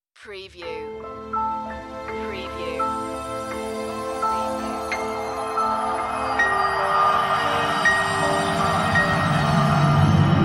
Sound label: Scifi Audio Logo Ident, Vulnerable, Darkly, Piano